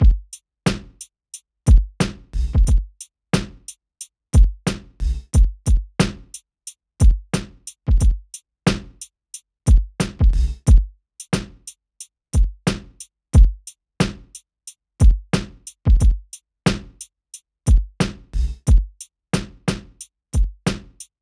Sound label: boom bap 90 bpm